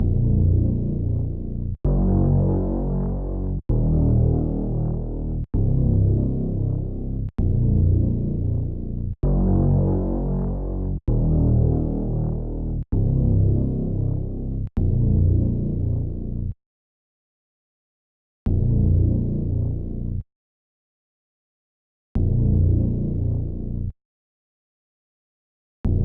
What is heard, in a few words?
130 BPM A# Min Bass, melody loop stem; Trap; Loop Stems; Gremlins